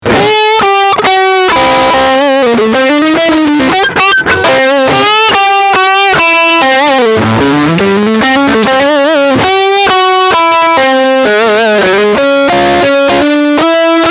Lick beide Pickups

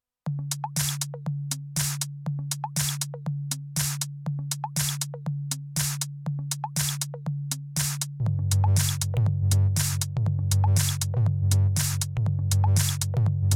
Volca Mix JAM Mit Send AUX Feedback

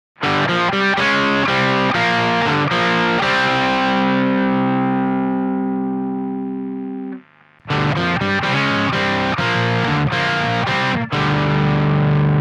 Riff oktaviert

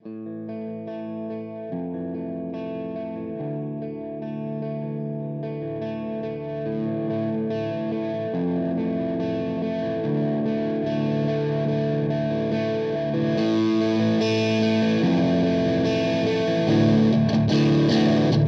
Verzerrer Pedale Volume Dynamics; Plumes

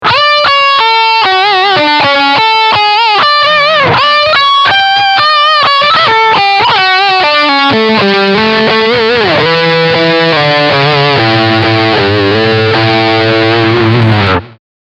Leadline Steg Humbucker

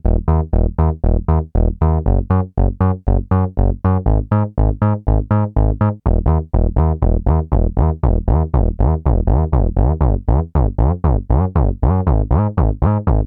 Teisco S100P WOOD BASS mit Portamento Mode A

